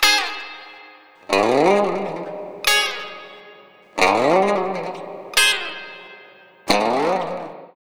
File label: Lounge Gtr G#; Zero-G Kocktail Kollection; Construction Kitz; Welk Lounge 090; 90 s Sample CDs